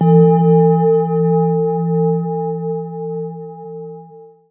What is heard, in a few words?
one round bell hit; Bells